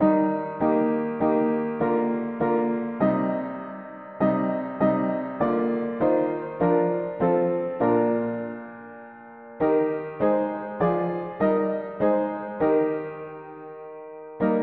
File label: mono piano; La haut siege le vainqueur; hymns